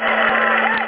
cheer

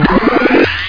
powerup; Astro Gunner

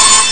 Organ

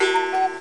KOOKOO

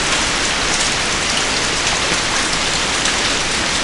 rain; WEATHER

